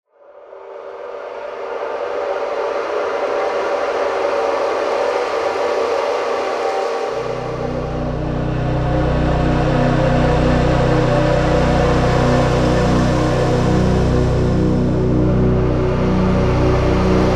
Ambient - Liminal Space; Pools

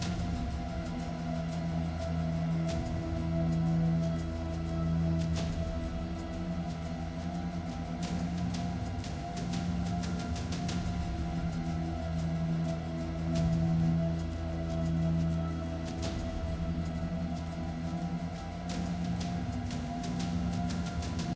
Combat Evade Loop; assassin s creed chronicles india; First Civ

